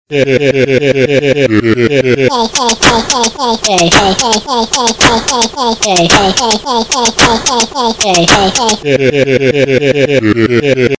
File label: super funny ring